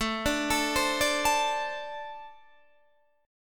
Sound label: A-Minor Double Flat 5th A Guitar DADGAD